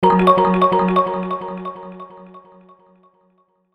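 Hit Mallet Percussion, Hit Mallet Vocal Pad Delay Low; Hit Mallet Sequence Delay; Blastwave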